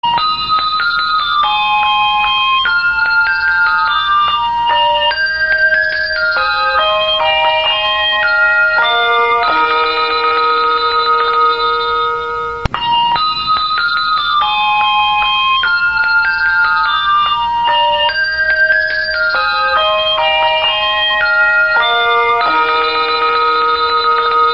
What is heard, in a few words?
jinglebells, klingelton; Weihnachten